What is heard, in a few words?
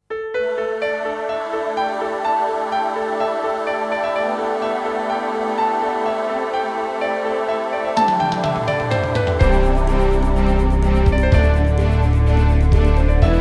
corporate